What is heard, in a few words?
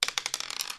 dice roll; Dice World